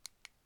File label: Button Being Pressed